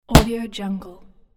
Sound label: catch baseball